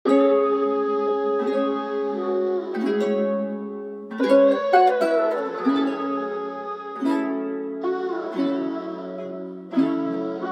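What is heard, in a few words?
87 bpm D flat minor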